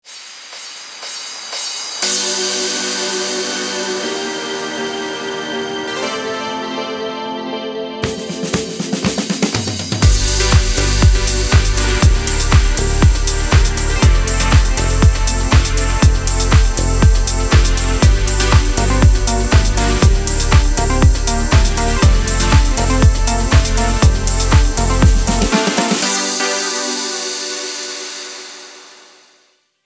HIGH LIFE (INSTRUMENTAL)

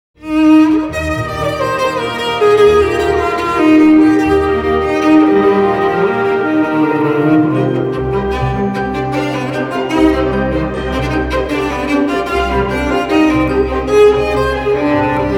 FIRST MOVEMENT

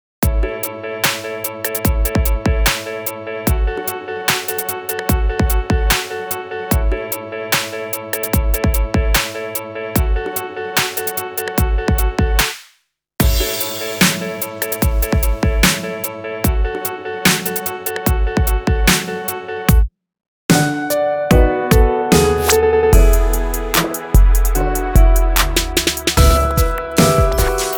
TURNTABLE ATTACK ON A G-STRING

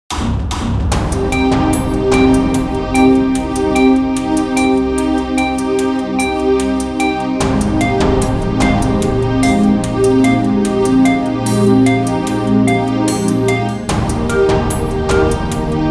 NORTHERN NEWS BED

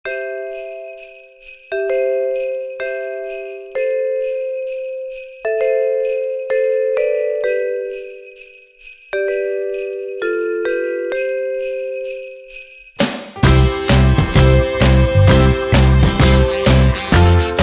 INSTRUMENTAL

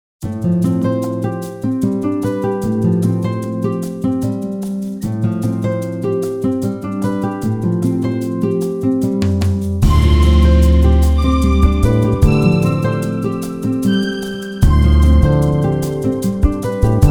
GENTLE NIGHT WAVES

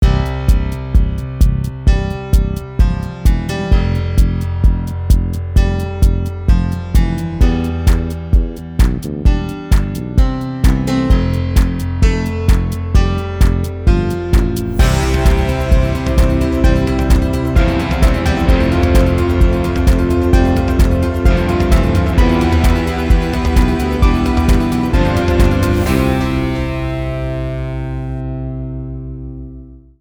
PIANO

